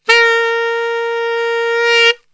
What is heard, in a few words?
A#2 SAX, Brass Super Section; Best Service